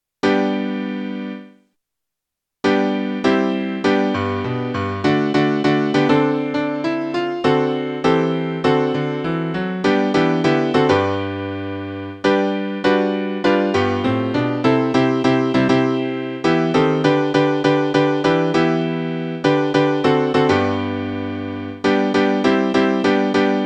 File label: I m so glad piano